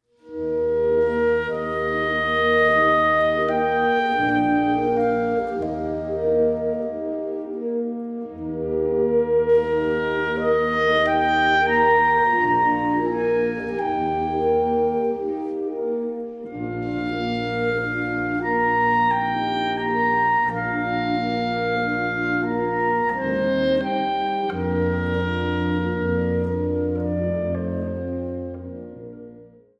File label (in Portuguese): Concerto para Clarinete